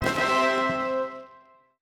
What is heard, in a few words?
win; coreplay